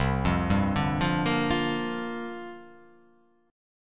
C 9th Suspended 4th C down

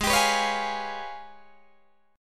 G sharp Minor Major 13th, Guitar DADGAD; G#mM13 strummed; 18,22,21,18,20,18

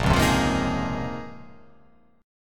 Bb Minor 11th, Bbm11 chord; strummed; JGuitar

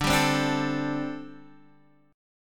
D 7th Suspended 4th D Guitar Standard; strummed; JGuitar

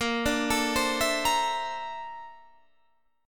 A sharp Major Sharp 11th, Guitar, Standard; 18,17,19,17,17,18; arpeggiated; capo=9

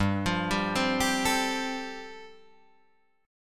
G 9th Suspended 4th, Guitar Drop D

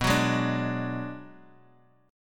B-Minor 6th B down Guitar DADGAD

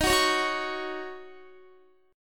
D sharp Minor 7th, Guitar; strummed

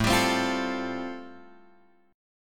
A Minor 7th Sharp 5th A, Guitar, Drop D

